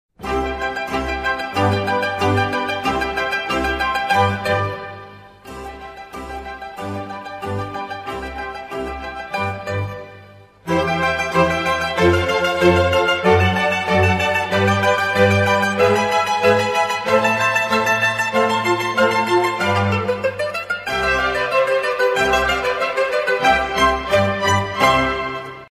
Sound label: mandolin ringtone; snake